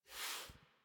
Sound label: Pet Snort; Enemies; Warframe; Catbrow; PetShip